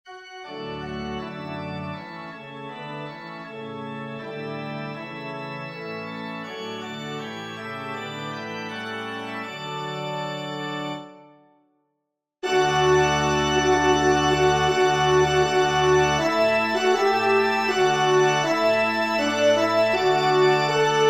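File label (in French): Trompe Orgue; Offertoire; messe cantin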